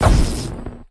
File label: Colorbomb Die; Candy Crush Soda